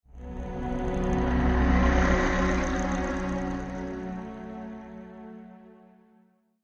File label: Passby Grow Center Tail; LYN115 Trailer Production Elements